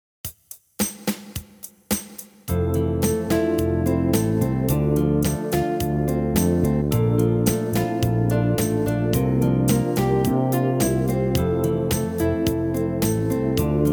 Improvisation With Treble Spaces F A C E

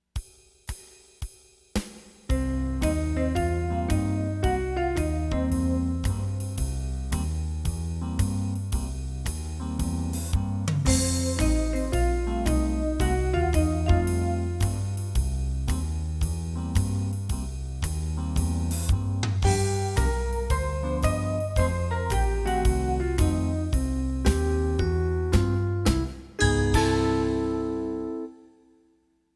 Blues Band Jam

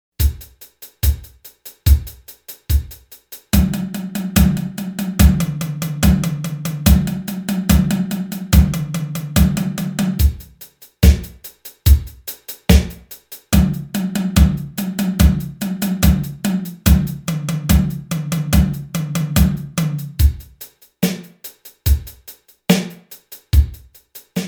Drummer At The Keyboard

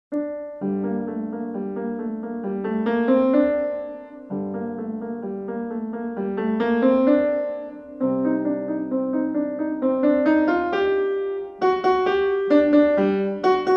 piano

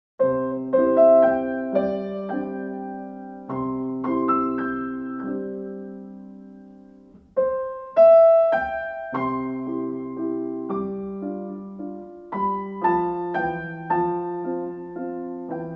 Morning Has Broken piano

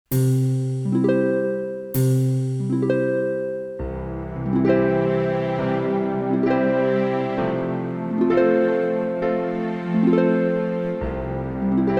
Pedaling Chords Slow, piano

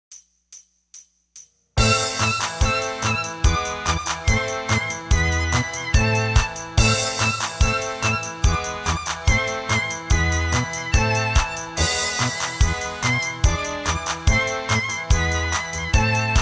Russian Folk Song, piano

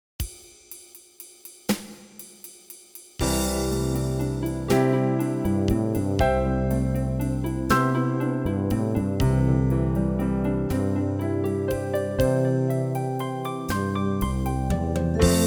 Primary Chord Study Slow

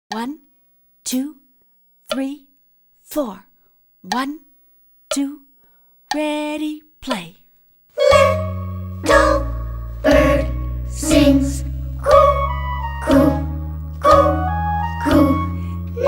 cuckoo clock

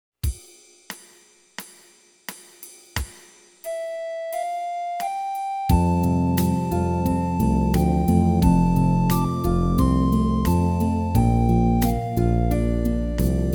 Broken 5th Accompaniment

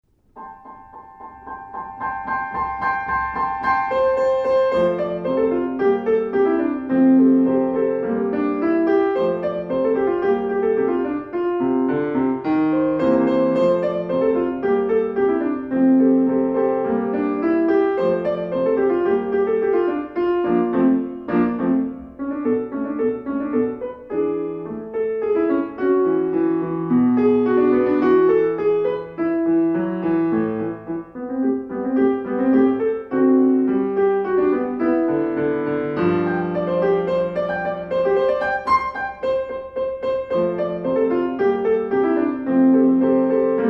piano

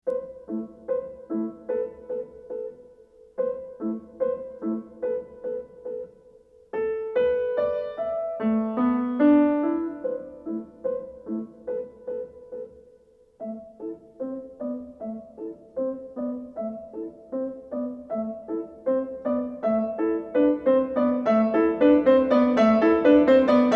Haiku piano

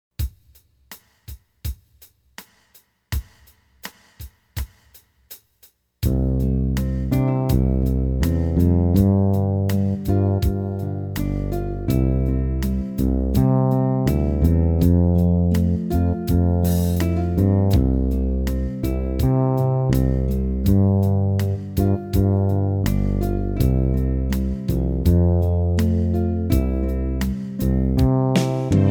Broken Chord Accompaniment; Auld Lang Syne

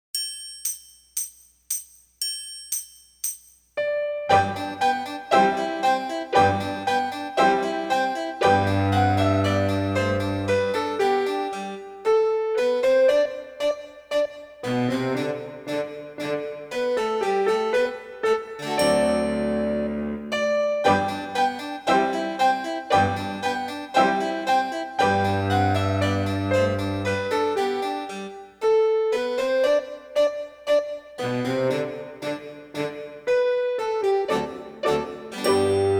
French Dance Slow

